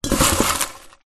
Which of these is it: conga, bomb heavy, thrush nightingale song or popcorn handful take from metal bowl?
popcorn handful take from metal bowl